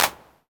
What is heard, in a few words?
One Shot Clap, Deep House; Lover Groove; Ghosthack; Advent Calendar 2019